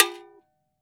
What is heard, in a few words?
AFRO AGO, AFRIC AGO GO